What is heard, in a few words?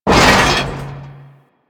Mech Head Impact; Nechro Mech; Vehicles